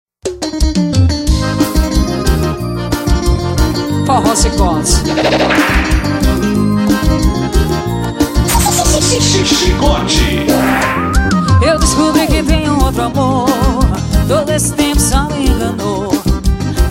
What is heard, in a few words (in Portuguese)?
forró